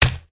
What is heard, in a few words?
forest spirit attack contact up